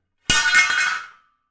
Pizza peel; anemoi

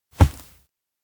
shoulder bump back; awayuki; anemoi

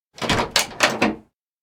door night duty open latch; anemoi

